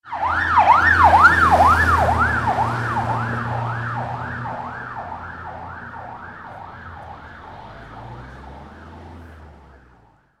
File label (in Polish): ambulans